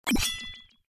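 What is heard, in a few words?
pick up weapon; crypt of the necrodancer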